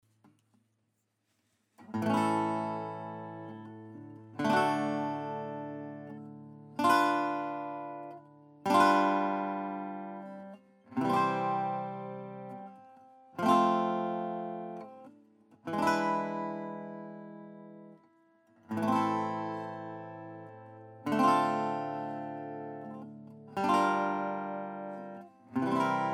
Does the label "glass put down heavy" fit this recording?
No